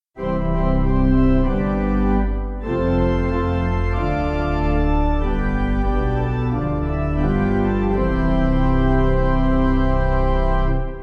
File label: Pipe